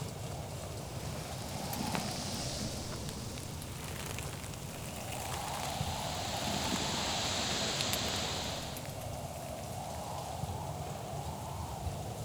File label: Ambience dust; adams venture origins